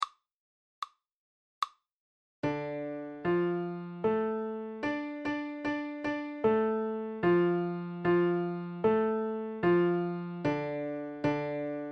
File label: minor triad; intervals